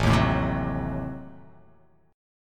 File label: A Diminished 7th A down